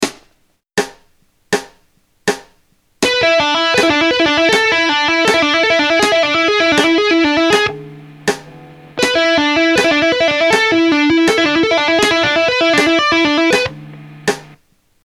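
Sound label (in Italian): Tapping, rock guitar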